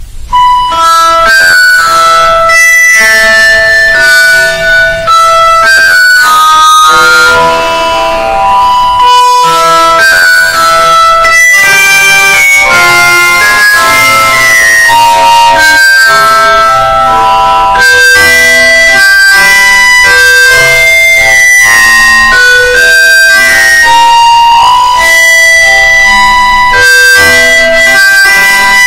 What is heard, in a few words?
EARRAPE; HARRY POTTER